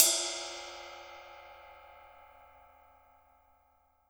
JAZZ KIT RIDE, Drums Acoustic; AKAI; 90s sample CD; USB Soundscan vol.10; JAZZKIT 3

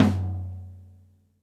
tom, Drums Acoustic; USB Soundscan vol.10; AKAI; DYNAKIT 1

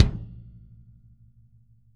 KICK, Drum Kits

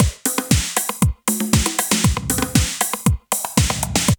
BREAK; Zero-G; Man Machine

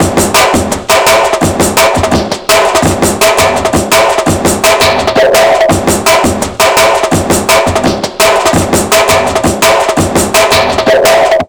168 break, Jungle; USB Soundscan vol.05; Explosive Jungle; AKAI; 90s sample CD

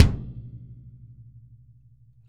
KICK, Drum Kits

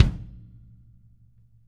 kick, Interactive Drum Kits

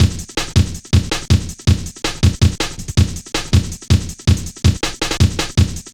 boom, Drum Loops; Zero-G Jungle Warfare Complete

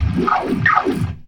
GLISS, ORGAN RIFFS; InVision Interactive

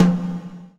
GATE TOM, Drums Acoustic

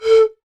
PAN P, Pan Pipe; NorthStar - Global Instruments VOL-2; Flute

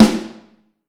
Snare Drum; Q Up Arts; Johnny.C's Vintage Snare Drum; 7.5X14

